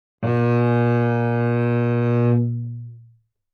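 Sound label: Cello arco fortissimo sul C Bb2; MIS Pitches - 2014; Strings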